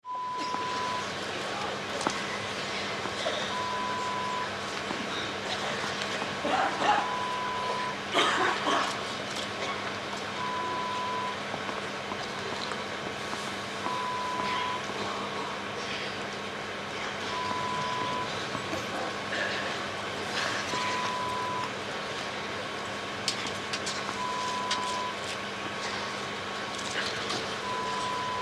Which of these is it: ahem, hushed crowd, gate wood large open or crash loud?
hushed crowd